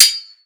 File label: sword